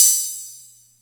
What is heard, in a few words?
Music Percussion Computer HH open, Drum Machines